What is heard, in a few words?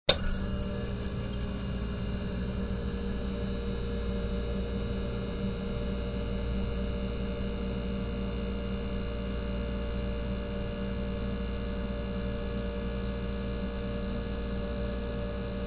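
vkl rabota i vykl holod, fridge switch on, running and switch off; шум работающего холодильника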